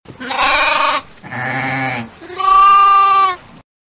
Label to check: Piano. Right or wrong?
Wrong